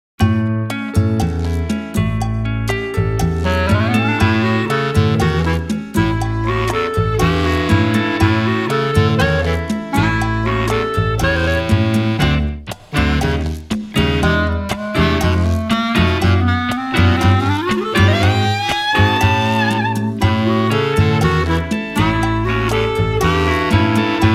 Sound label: back Music